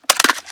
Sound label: reload magout; gmod; viper